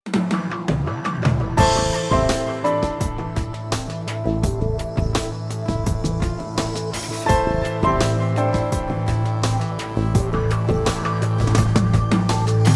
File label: loop